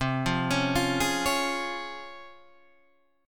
Db Major C, Db/C chord; arpeggiated; JGuitar

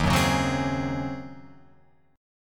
Eb Minor 13th Eb down Guitar Open F; Ebm13 strummed; JGuitar

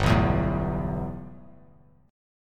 G 6th Add 9th G, chord; G6add9 strummed

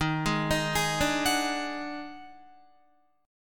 Eb Minor Major 7th Double Flat 5th Eb down Guitar; EbmM7bb5 chord; JGuitar

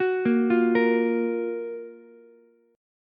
Gb Major 7th Gb Ukulele; GbM7 arpeggiated; JGuitar

